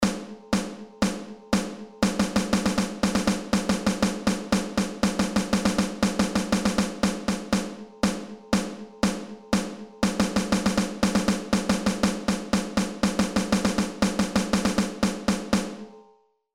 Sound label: Rhythms Snare Drum